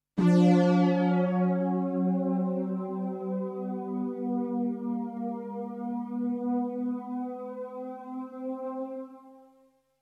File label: polypitch; A flexible environment for music composition in non-European contexts; pitchrange(200) press(0) pitchbend(0) pitchcont presscont do4